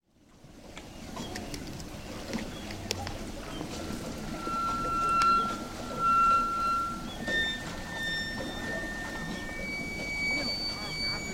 Rajasthan Singing Well

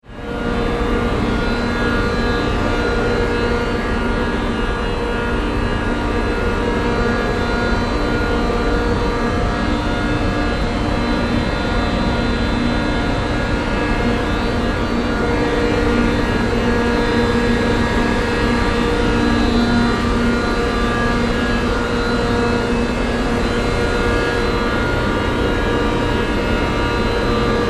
Transformer

